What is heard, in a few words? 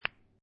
Billiard Pool Snooker Two Pool Balls Clacking Together